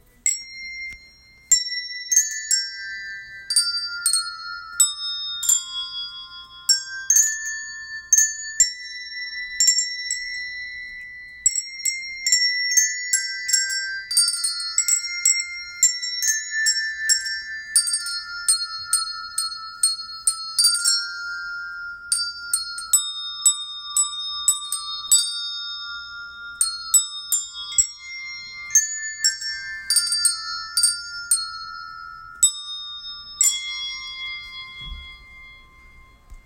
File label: Joy to the World Bells